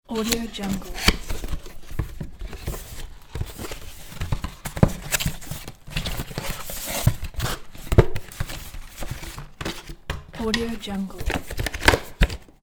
opening box

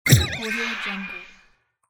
thuds cartoon; volkovsound

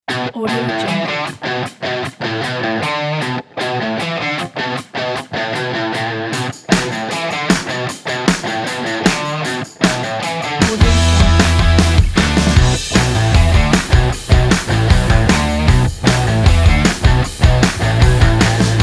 energetic upbeat rock

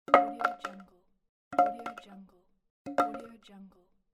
drop wood plank

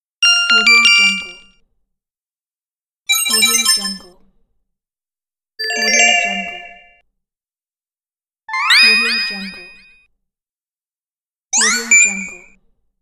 bright unlocked sounds

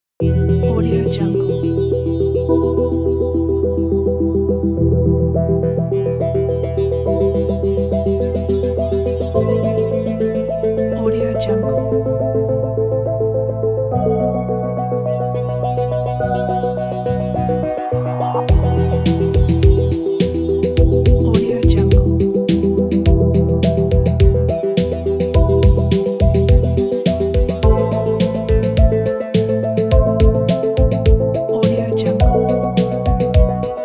mystical workshop

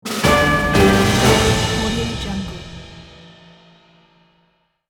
dramatic orchestral breaking news jingle; tony-parsons-music

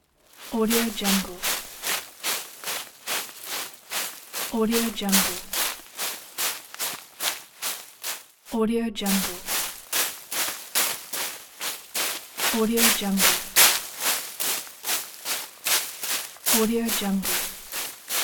run on leaves

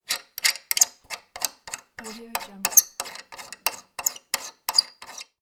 screwing bolt squeaking

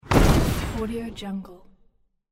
modern mortar fire

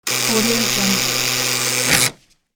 buzzer to open door

